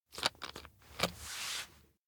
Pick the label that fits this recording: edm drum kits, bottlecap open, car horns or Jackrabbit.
bottlecap open